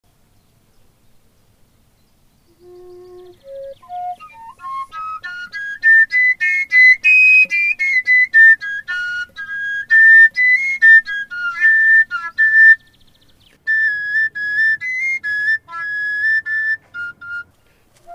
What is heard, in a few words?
flute